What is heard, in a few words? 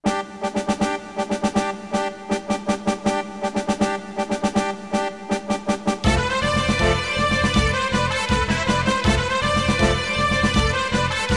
Decisive Battle Bolero; Vain Dream Glodia